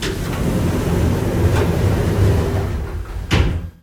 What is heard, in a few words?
elevator close; Golden Crayon Sounds